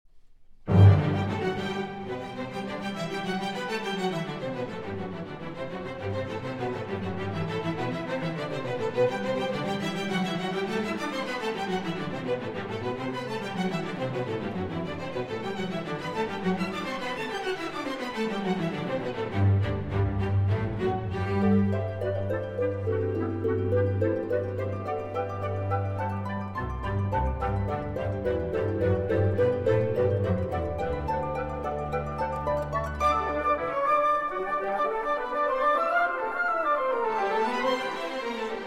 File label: Harp Concerto, Allegro; Rota